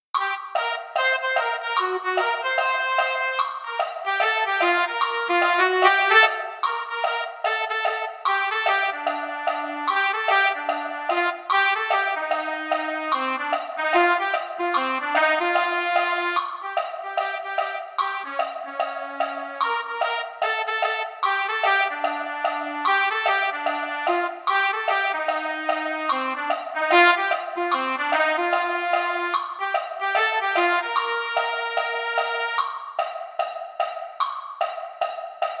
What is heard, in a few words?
trumpet; holiday brass